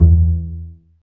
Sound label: STR, STRINGS; Roland - LA Composer vol. 1